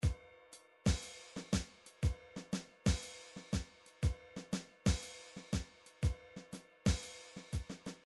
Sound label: drums, midi drums